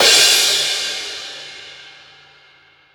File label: Hitsound percussion